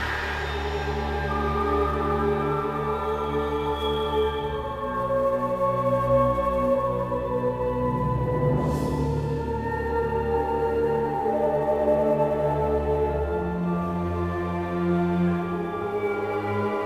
Ice Dungeon (Ambient); gamerip; Sacred Odyssey Rise of Ayden; android

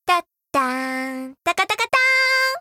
Humming; Hyrule Warriors Definitive Edition

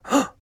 Emote Male Shocked; Idle Champions of the Forgotten Realms

